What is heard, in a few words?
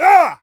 warrior attack swing; Aragami